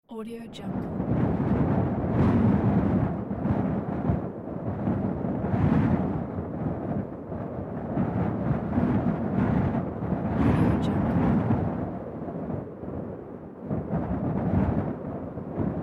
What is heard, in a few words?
strong wind